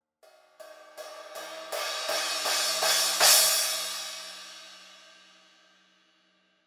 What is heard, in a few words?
14 Trash Crash Top crescendo hits; cymbal